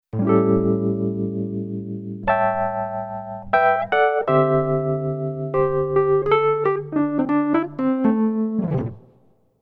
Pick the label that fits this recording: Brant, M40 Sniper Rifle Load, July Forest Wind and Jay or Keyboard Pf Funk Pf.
Keyboard Pf Funk Pf